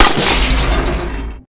objects, World of Tanks

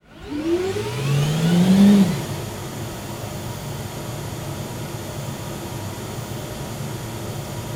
Aircrafts F-15 JFS; DCS

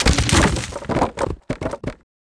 fence beam; World of Tanks Blitz

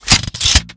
anchor start

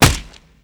Inf Weapons Foley; Red Orchestra Ostfront 41-45

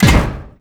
biomech run hard

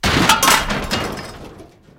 fence metal fall

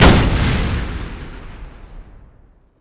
frag grenade explode far

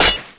pick ice snow

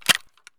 saiga stock close fold; Escape from Tarkov

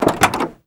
van door open; favela; Call of Duty Modern Warfare

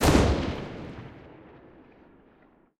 mg 8mm sgmt lastshot; guns; tanks; War Thunder

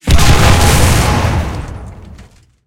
tank hit big

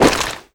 foley gear land; Call of Duty Modern Warfare

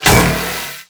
weak spot small

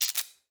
light gas spark; Escape from Tarkov

